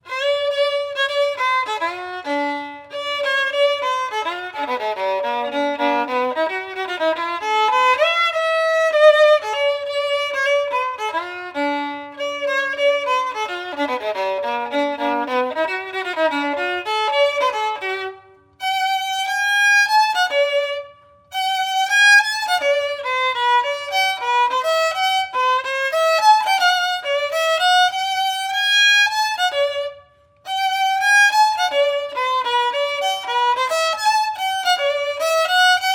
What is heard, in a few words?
Swing, keman